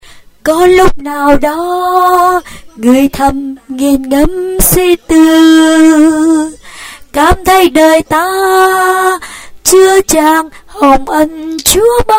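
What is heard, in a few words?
soprano alto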